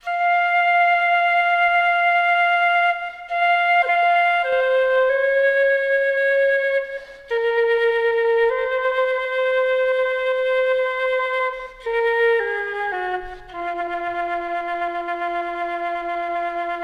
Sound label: Start Music; blueberryPeak; Wwise; BeachScene